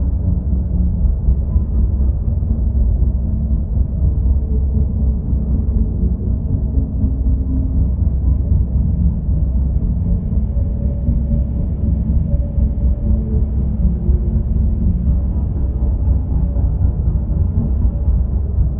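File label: fallen empire starbase ambience; apocalypse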